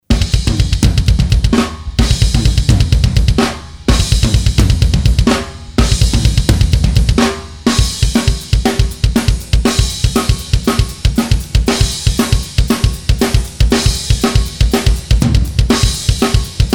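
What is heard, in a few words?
zvuki barabana, drum sounds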